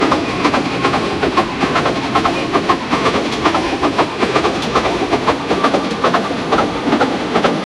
chugging of an old steam train pulling into the station; arrival